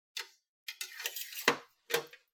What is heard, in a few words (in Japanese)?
包丁を取り出す音